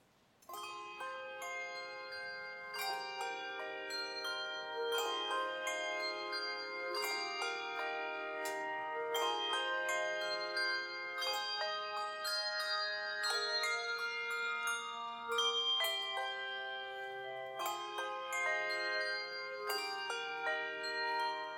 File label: Offertory, Carillons